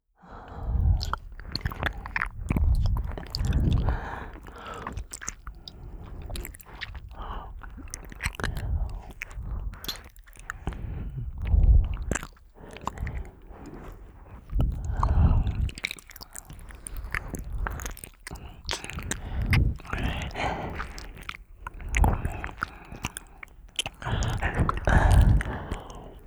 双子のペロペロ; 昂ぶった双子のペロペロ

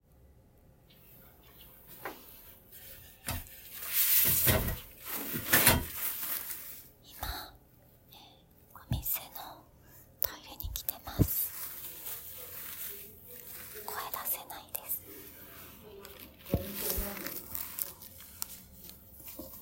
おしっこ実演, お店のトイレでのおしっこ

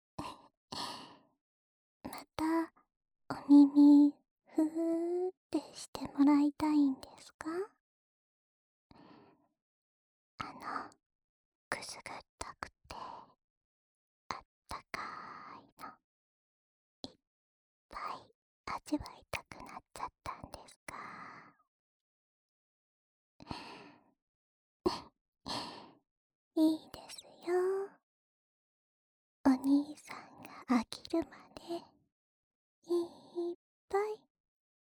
お耳ふーふーループ（右側), ASMR

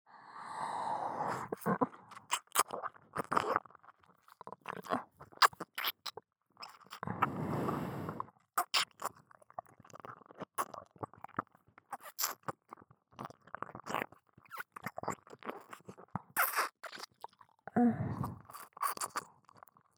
左耳舐め, ASMR

